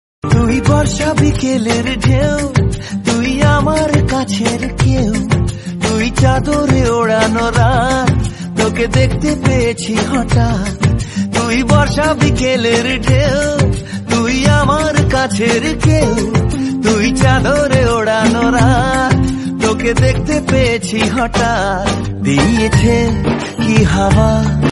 bengali song

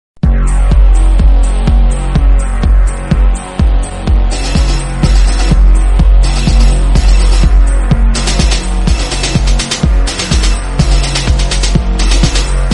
background music; spongebob

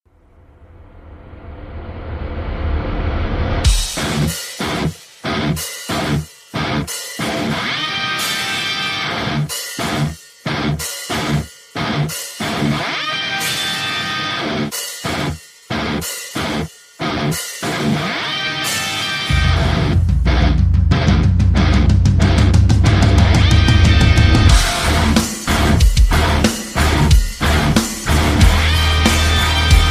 rock

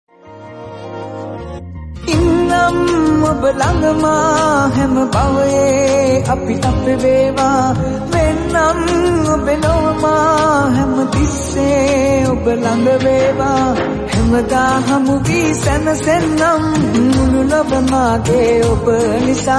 sinhala songs

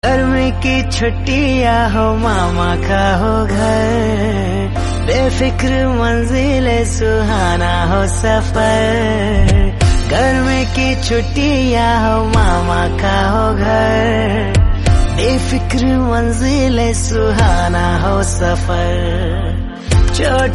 song hindi

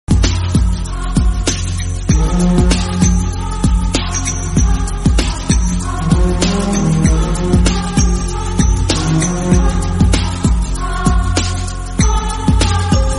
instrumental background music

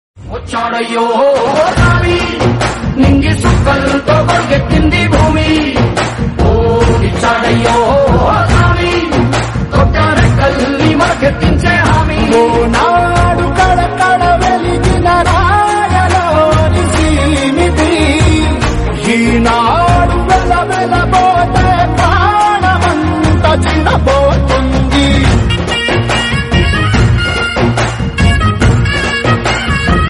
powerful entry bgm, mafia

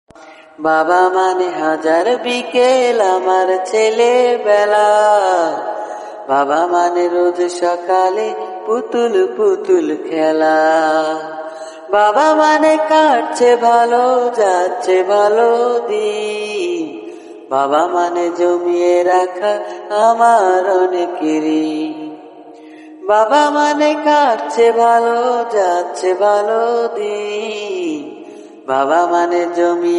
baba song, bangla

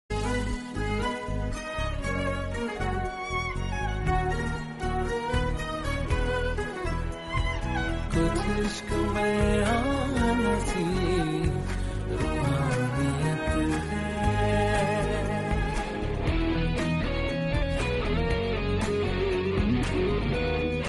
bgm hindi; roohaniyat; arjun bijlani

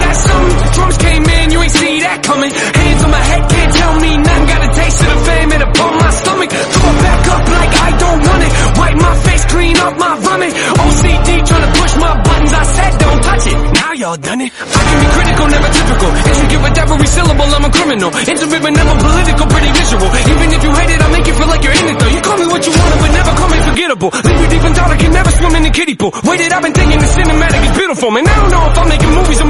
english song

